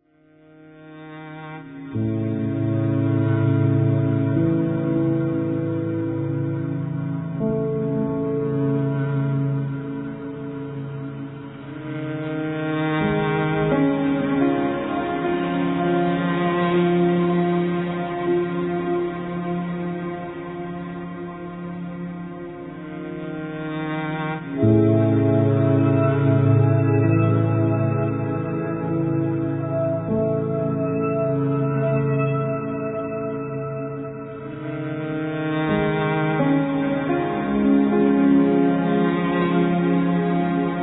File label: piano texture; 闇夜に煌めくピアノの旋律